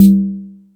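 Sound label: Jungle Percussion, Drum Machines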